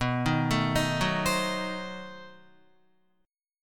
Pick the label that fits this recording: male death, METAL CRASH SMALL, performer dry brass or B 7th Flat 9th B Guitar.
B 7th Flat 9th B Guitar